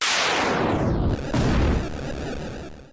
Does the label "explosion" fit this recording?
Yes